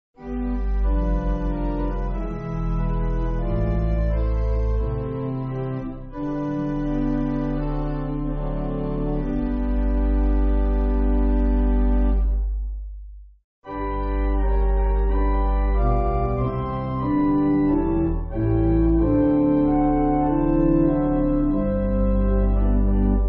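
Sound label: Love Is The Key, pipe organ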